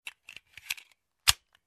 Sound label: Pistol magazine insert